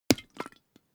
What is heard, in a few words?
hot-water bag punch hard